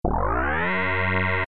noise blaster; ff3us

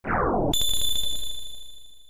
vanish; ff3; ff3us

